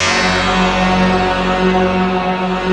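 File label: F#2, rough stick; Best Service; Advanced Media Trax Modern Composer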